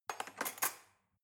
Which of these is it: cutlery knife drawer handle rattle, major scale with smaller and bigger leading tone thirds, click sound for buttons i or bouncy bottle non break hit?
cutlery knife drawer handle rattle